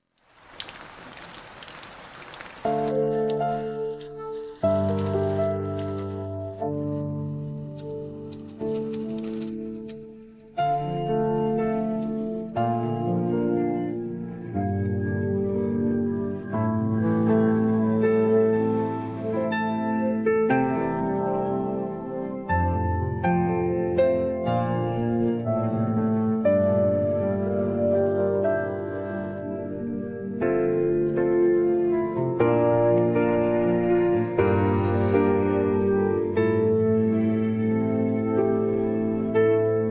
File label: piano Version